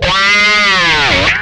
whammy fx, guitar

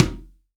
CONGA H; STEREO CONGA; Cuba

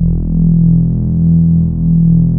JUPITER 8 E2, Rare Synthesizer; Hollywood Edge - Giorgio Moroder Rare Synthesizer Collection

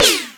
MISC, Skanky Perc

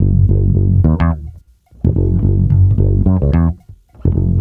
Bass Loop, Ambient Grooves

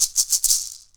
PUILI, HAWAII PERC

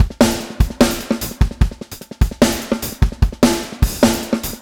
Funk Break, Drum Loops

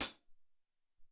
Mid Hat, Alesis SR-18

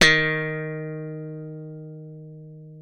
D#3, slap thumb; Xsample VOL-1

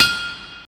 CYBER KIT, DRUMS

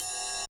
kawai k1 RIDE; wavetables

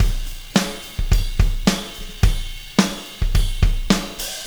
Rock Beat; Sonic Foundry (Sony Creative Software); Crimson Blue and Fabulous Horncraft 4 RnB; DrumSugar

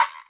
EQ Perc, Percussion Mix

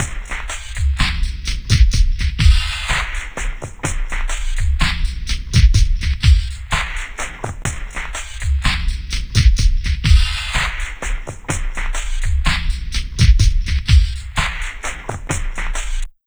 63 PHASER, Dark Side Of TripHop; USB Soundscan vol.35; AKAI

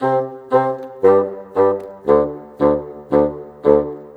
Bassoon, Orchestral, Rock Pop; Sonic Foundry (Sony Creative Software); Orchestral Series VOL-4; Rock and Pop; Rock-Pop 03

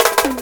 Fill Up; Mo' Phatt; Digital Sound Factory Mo' Phatt

